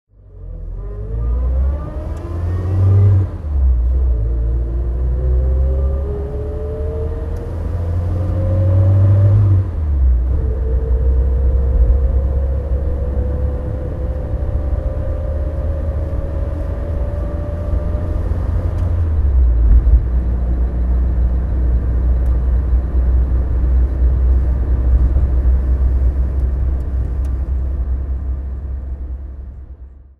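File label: triumph herald convertible drive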